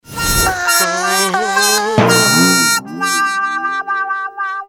Lose Screen In; bob espo

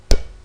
BOUNCE; trueSpace 7.6

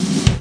BUTTON

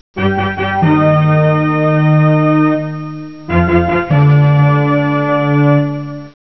CLASSICL; Simon and Schuster Interactive

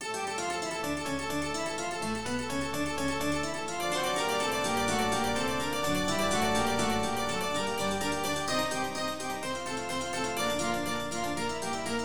TOCCATO

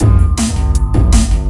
DRUMLOOP D+B 160, Drum Bass Loop; eJay

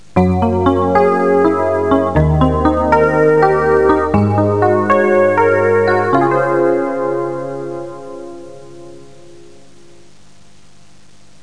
Game Over (Pf Long)

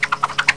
ROPE WHIP; RpgMaker

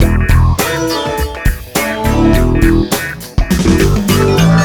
Funk acid; FUNKACID1

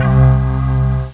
lo brth C1; Flashback Demo

